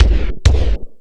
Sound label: Lotsa Kicks, Drum Machines